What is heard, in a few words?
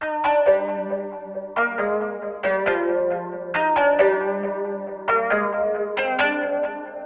Melody Loop - 136 BPM C Min Lead; Melodies; Loop Stems; Cymatics; Oracle Sample Pack; Oracle Various Melody Loop 11